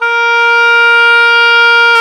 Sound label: oboe, Oboe Brth